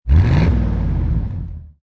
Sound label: Rev Short, cars; Sheriff